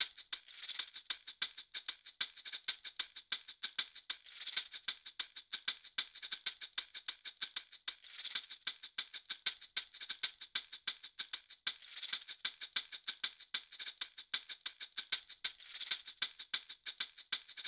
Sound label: Freeze 8 Bembe Percussion